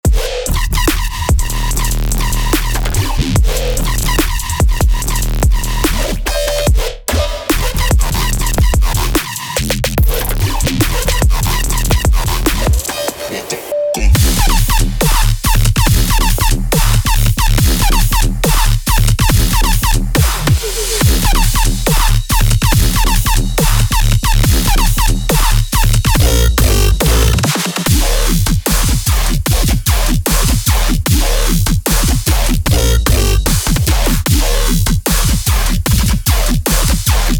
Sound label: Drops Only, serum; XLNT; Drops Only Vol3; Xfer Records